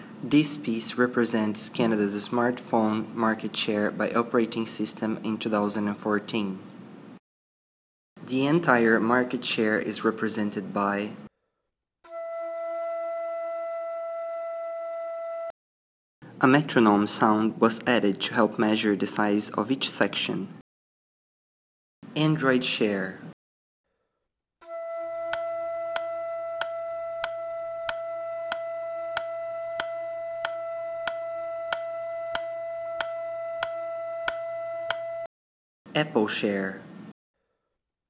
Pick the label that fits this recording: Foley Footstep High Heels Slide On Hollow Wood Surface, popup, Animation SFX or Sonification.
Sonification